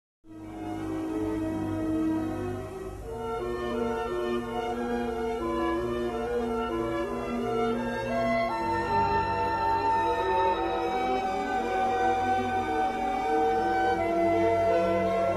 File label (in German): Andante rubato, Klarinette und Klavier; Peters Musikverlag